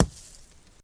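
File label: Rock Falls In To Sand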